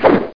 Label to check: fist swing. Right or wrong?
Right